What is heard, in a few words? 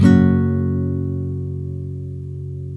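NYLON, F# MAJOR DOWN, CHORDS; Spectrasonics; Hans Zimmer Guitars VOL-1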